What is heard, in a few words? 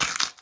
Crunch